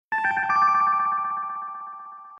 Notify, notifications

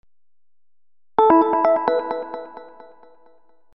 MESSAGE ALERT; NETPHONE; Smart

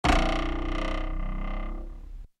Wood instant message; Nokia; audio themes

